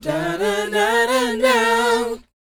BOYZ F, Vocal Planet R&B & Dance; ILIO

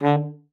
D3, alto sax; Xsample VOL-2

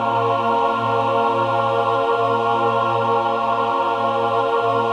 min chord, Symphony of Voices

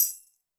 shaker, Zoom MRT-3B

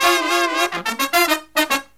Brass Riffs

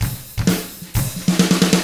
HARD ROCK, Drums

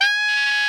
TENOR GROWL G#5, Saxophones; Greytsounds; Philip Wolfe David Crozier's Saxophones

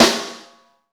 snare, Roland

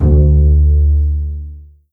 D#1 PIZ F BAS, string; East West; The Ultimate String Collection

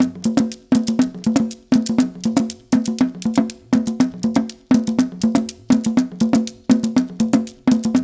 120 JU, African Contemporary Ju Ju; Spectrasonics; Supreme Beats

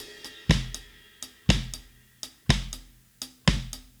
Drums Crash, 70s Analog Funk; Sonic Foundry (Sony Creative Software); What it Is! 70s Analog Funk

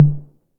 808 MT Tape, Tom; Goldbaby Tape808 & Tape909

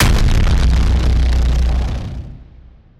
Distortion, fx

